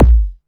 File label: kick